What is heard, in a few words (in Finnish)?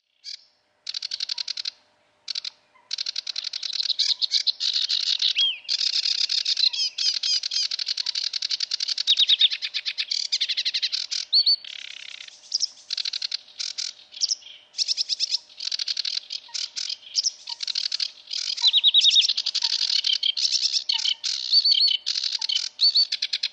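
ruokokerttunen, lintuäänet; Laulu kiireistä, paljon karheita rätinöitä sisältävää; Helppo erottaa muista kerttusista; Kosteilla runsaskasvustoisilla paikoilla, erityisesti lintujärvien korkeissa ruoko- ja osmankäämikasvustoissa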